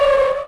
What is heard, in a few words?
Slow Skid; urbanchaos